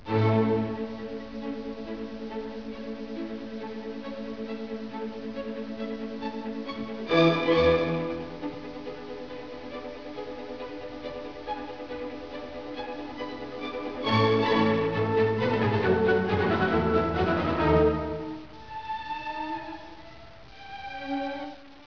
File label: mozart violin